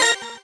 normal hit normal

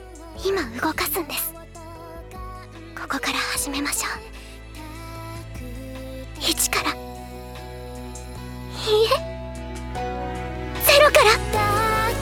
applause; osu

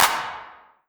normal hit clap

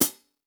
Hi-Hat pedal

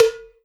Cowbell; osu

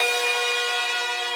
synth main

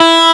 arp; osu

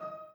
piano

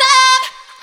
vocal

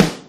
Drum; osu

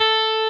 Arp

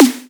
pitched dry snare

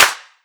Clap

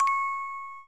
orgel

